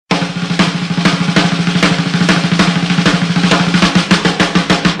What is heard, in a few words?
Percussion